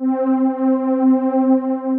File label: Softer Than 70, Synth Pad; Ableton; Factory Packs; Synth Essentials